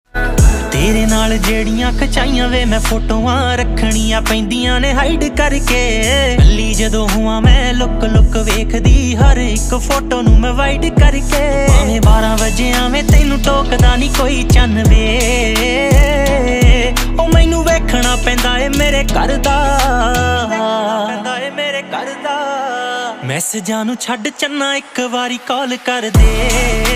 Jatt Punjabi Ringtone

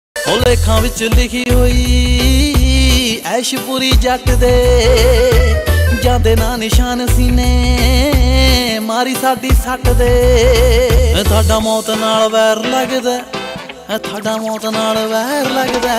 Punjabi Beats

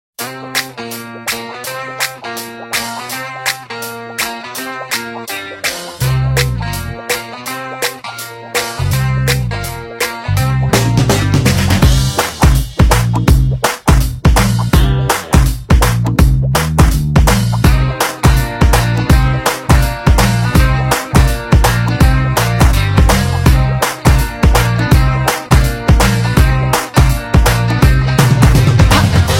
BGM Telugu Ringtone

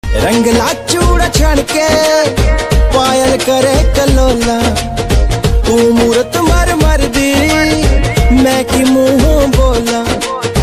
Desi Punjabi Ringtone

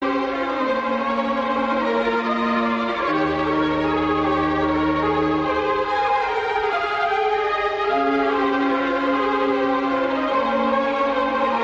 Sad BGM Telugu Ringtone

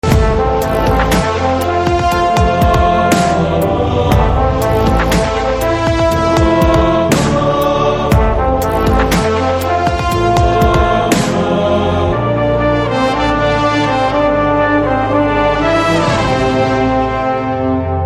BG Theme Tone Telugu Ringtone; Bharat Ane Nenu

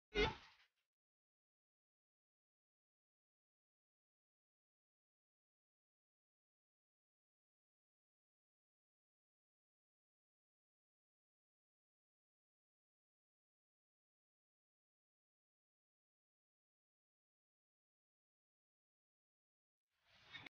Trending Punjabi Ringtone

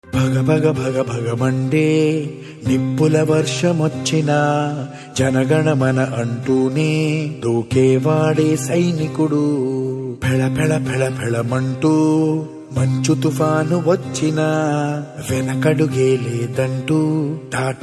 Anthem Telugu Ringtone

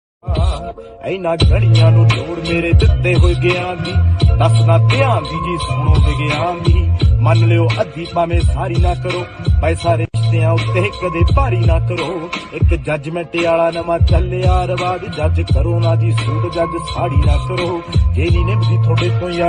Punjabi Tune Ringtone

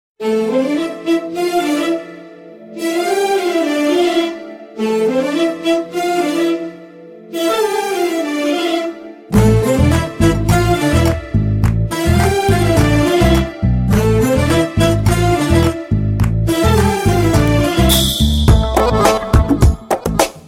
BGM Telugu Ringtone

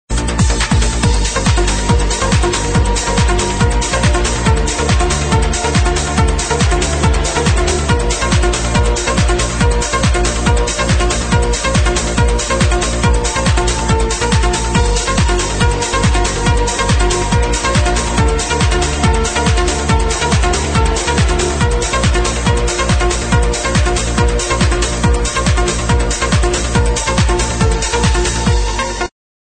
Techno Ringtone

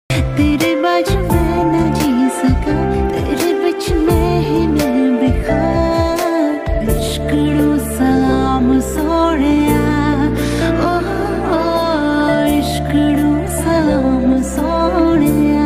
Punjabi Beats

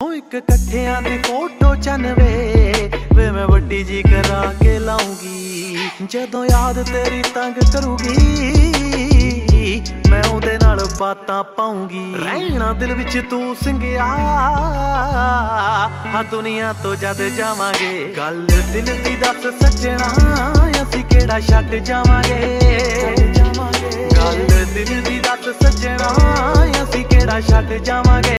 Dance Punjabi Ringtone

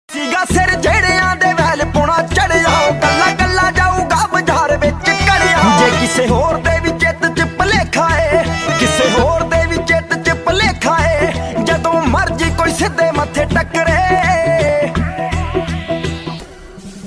Popular Punjabi Ringtone

